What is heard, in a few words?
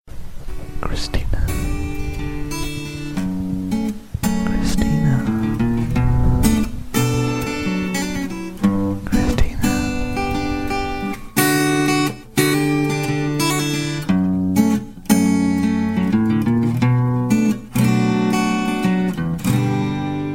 Alarm Soft